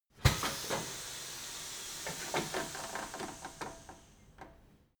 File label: tire changing machine hydraulic arm separator out